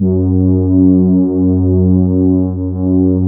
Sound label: CLAVI F#1